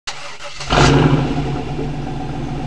Corvette Startup; Vehicles; DesktopThemes2000

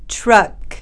TRUCK; CIDADE

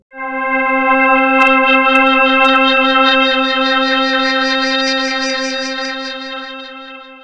soft, Synth

